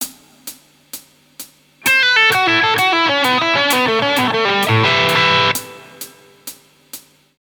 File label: Lickbag, Guitar; Guitar Techniques 2018 Issue 289 December